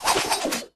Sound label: Whirlwind attack; Barbarians Berseker